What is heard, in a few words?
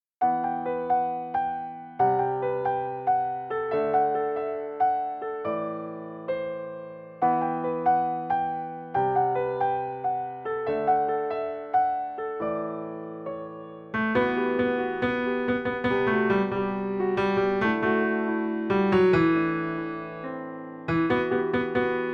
Melody; Elsa; Mickey Wndr Book